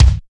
original bass drum single hit C key

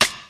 smooth snare single hit f key; Duration 0:01; Samplerate 44100 Hz; Channels Mono; snare drum samples

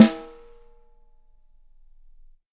thick snare single hit a key

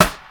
sharp steel snare drum f sharp key

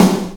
hot snare drum f sharp key; Mono

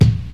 clear kick single shot a sharp key

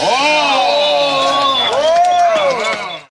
gasp in wonder

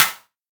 clap one shot e key; percussion; single hits one shots

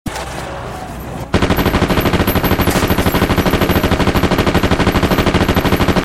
full auto rifle

